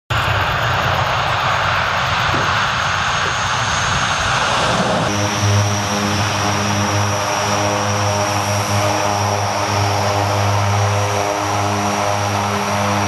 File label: Lockheed C-130 Hercules